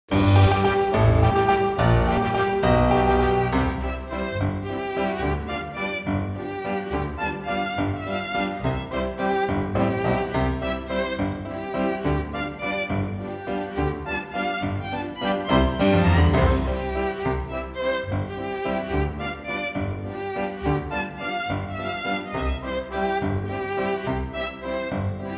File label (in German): Walzer